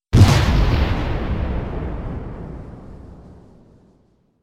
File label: Explosions; evil-inside